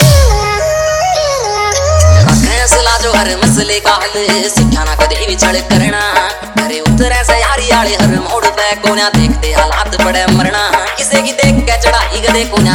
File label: Hard Dholki Remix Song